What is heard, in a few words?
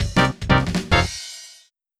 Jingle Comic Start